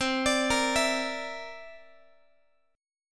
C 9th C down Guitar 4A, 4E, 5A, 5D, 5G sharp, 6C sharp; C9 arpeggiated; JGuitar